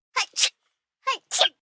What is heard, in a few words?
Sneeze Achoo, Sneeze, 1 child, cute, double, human voice, kid; Sneezing; Voice Box